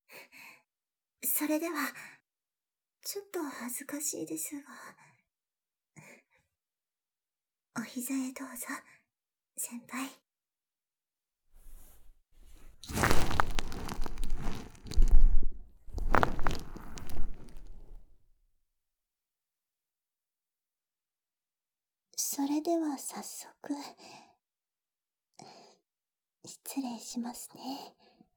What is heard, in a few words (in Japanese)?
ひざまくらで耳かきを; 後輩マネージャーとひとつになる、ひみつの部屋